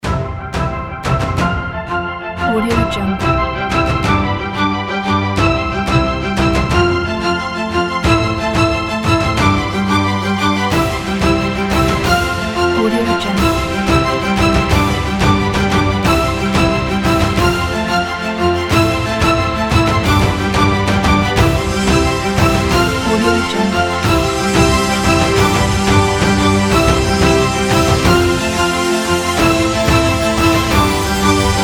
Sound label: opener